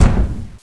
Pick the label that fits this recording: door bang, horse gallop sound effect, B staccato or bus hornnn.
door bang